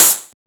Open Hat; FLPRO

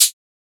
Shaker; FLPRO

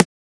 Transient; FLPRO

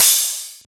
Crash

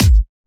Kick, Kicks; FLPRO

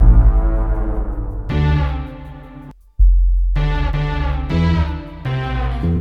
Loop

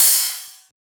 Ride; FLPRO

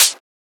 Closed Hat

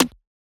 Perc, Percussion; FLPRO

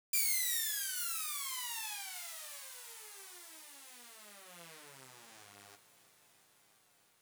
Fall Simple, Falls

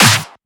SnareClap; FLPRO

